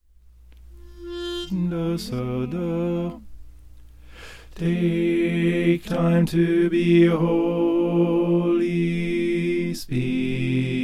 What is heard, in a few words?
bass